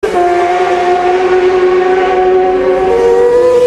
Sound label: Bmw s1000rr flyby